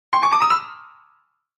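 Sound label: Grand Piano Fast Comedy; keyboards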